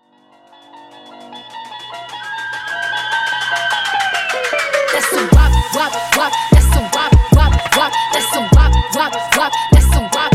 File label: Top40 Halloween Trap